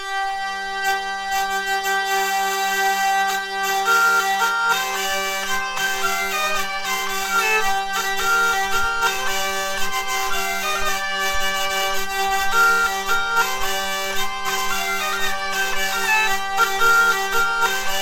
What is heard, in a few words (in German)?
Lautenform